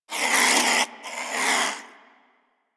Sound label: Vocal Snore; Warframe; Hunting Critters; Cryptilex